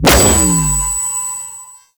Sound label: Sentient Symbilyst Laser Beam Fire; Warframe; Weapons